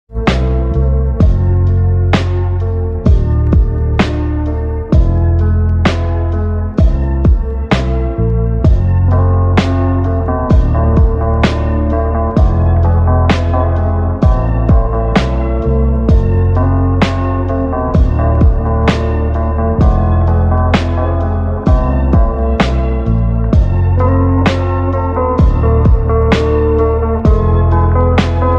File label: slowed reverb ringtone, instrumental ringtone